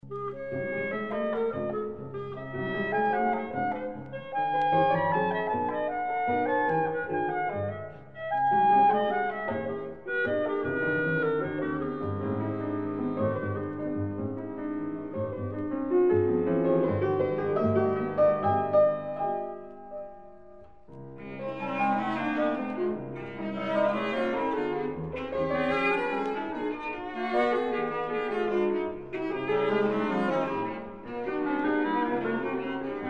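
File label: clarinet trio; berger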